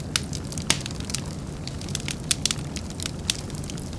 med fire; The Sum of All Fears

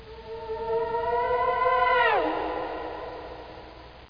Wierd

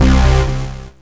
bass; Descent II

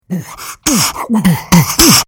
concert; MOBICLIC63

